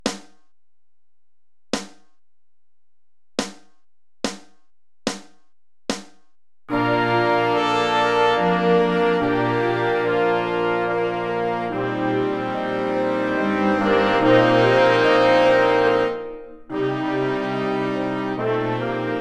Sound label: Swan Chorale